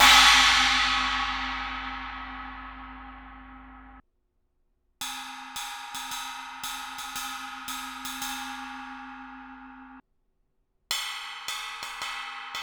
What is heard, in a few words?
19 inch china crash ride bell, cymbal; Sabian; vault holy